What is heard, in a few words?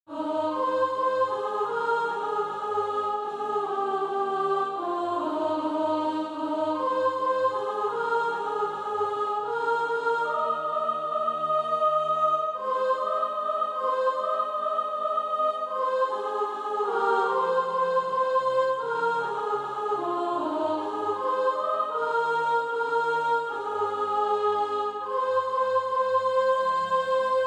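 soprano, SATB Practise Music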